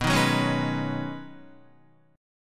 B 6th Add 9th B Guitar, chord; strummed

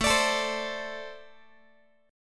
A Minor Double Flat 5th, down, Guitar; strummed; JGuitar

